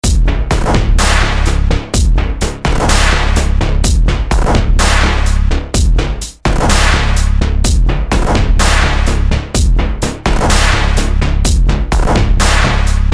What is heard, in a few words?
rr loop; ns siege0815